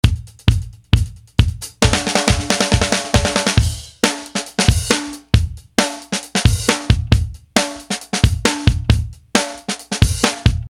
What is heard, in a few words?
drum